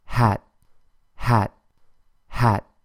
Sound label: sound hat